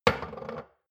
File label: shot glass place table hard wobble; soundpool